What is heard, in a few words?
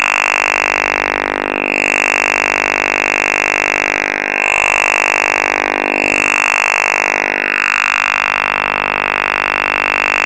Cypriot OTH Radar 50 sweeps-sec